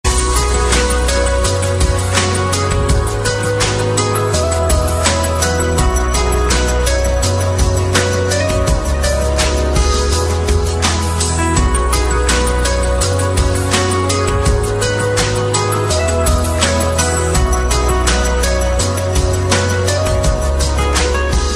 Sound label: themes, ringtone; twilight